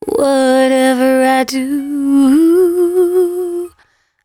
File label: 113bpm C#, Velvet Vocal; Zero-G